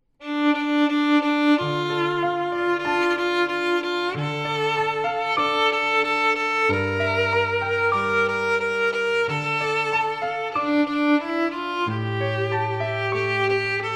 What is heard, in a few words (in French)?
Petite fantaisie violon et piano; IUAV; musique instrumentale